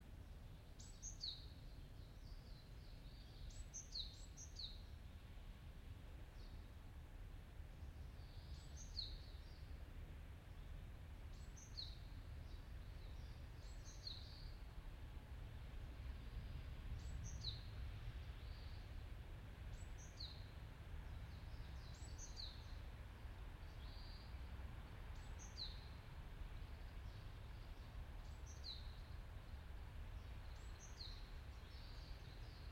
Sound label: yamanakako sound